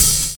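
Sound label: hi-hat; USB Soundscan vol.46; AKAI; CYMBALS; 70 s Breakbeats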